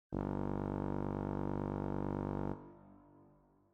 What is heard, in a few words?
low Pitch; basic music principles; music and music notes